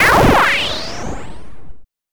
FX; Effects

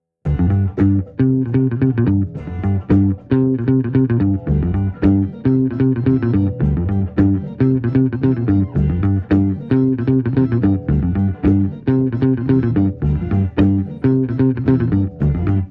Bass

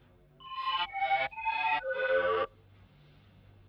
Synth Fairlight Single Note Intro

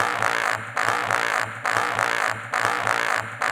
Intro Noiseloop

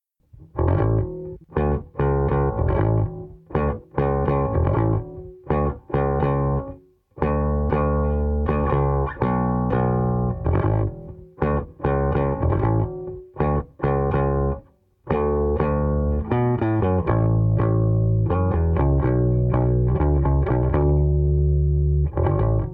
rhythm

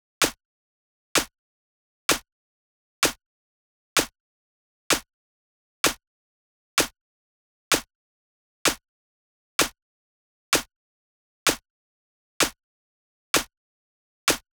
128 CLAP LOOP, House; D&M - House World Construction vol 2

